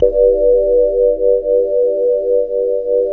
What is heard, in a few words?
PAD BASS PAD; Sound & Vision; Gigapack I CD 2; PAD SYNTH-PADs 1